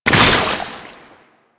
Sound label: Inf Arm Cannon Mech B; Warframe; Weapons; Infested